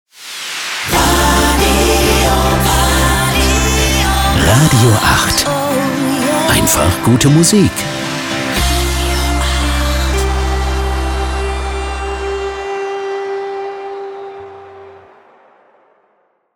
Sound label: RADIO Transition med SYNTH soft; RADIO 8